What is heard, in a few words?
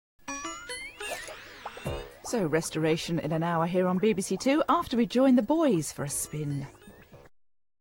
jingle; bbc